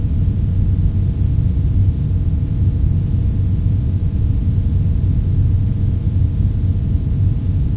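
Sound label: quiet cellblock ambience; hl2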